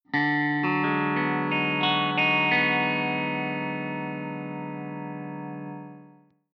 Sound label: open bm6 alt, tunings